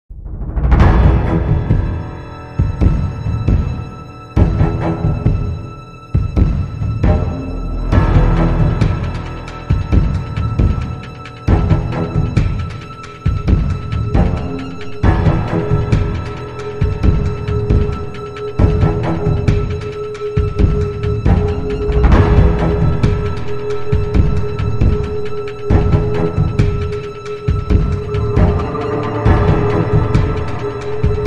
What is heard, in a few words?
Dramatic tension; Bootflare